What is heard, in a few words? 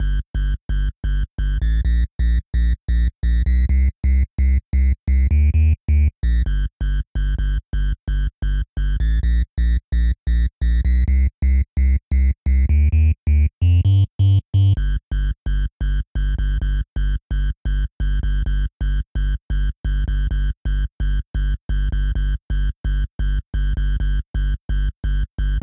Fire Bass; wonder wickets